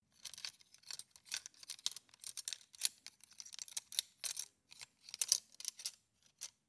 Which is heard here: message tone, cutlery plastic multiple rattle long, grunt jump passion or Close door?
cutlery plastic multiple rattle long